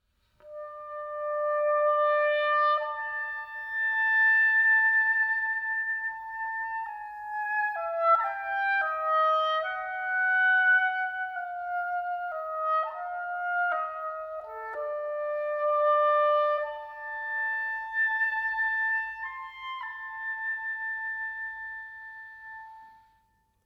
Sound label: Oboe